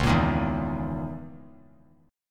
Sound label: Db Minor 6th Add 9th Db down; Dbm6add9 strummed; JGuitar